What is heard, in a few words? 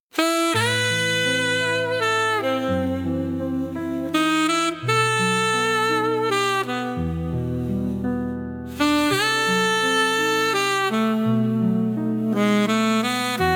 golden sax vibes